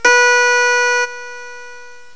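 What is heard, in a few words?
HARPSICHORD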